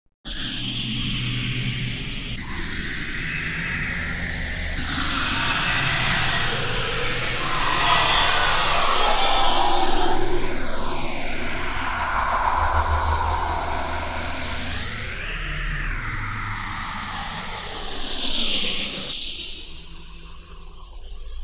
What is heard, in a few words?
noise film monster